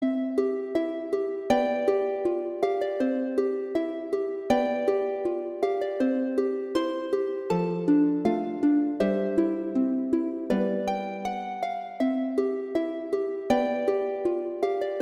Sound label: Sonata, Andante; Dussek; Sonatas